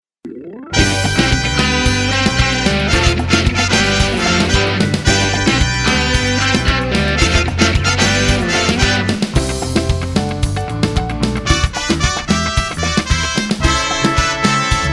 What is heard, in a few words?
Jingle